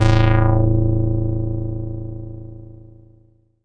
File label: Juicy a1, Korg Poly-800